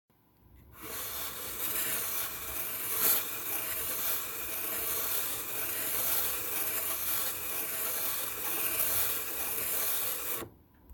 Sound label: friction